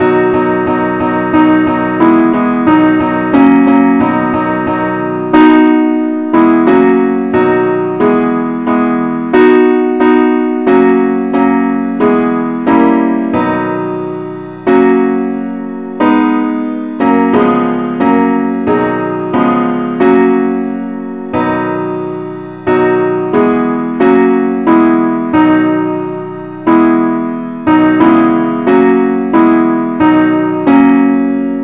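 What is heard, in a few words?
simple accompaniment key b; christe Sanctorum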